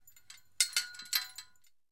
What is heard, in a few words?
Flag Pole Clanks; Rope And Chain Movement Against Flag Pole. - Flag Pole Clanks